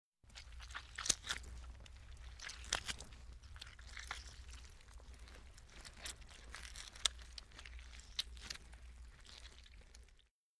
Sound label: Ripping Flesh